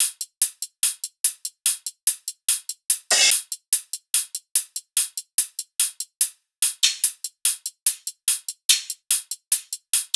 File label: loop hat 145; Seismic Loops